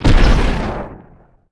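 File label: explosion small generic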